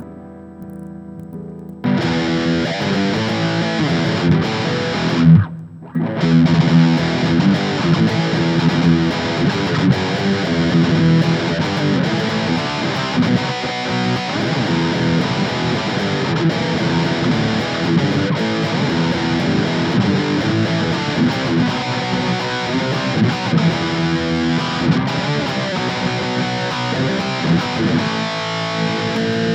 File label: Distortion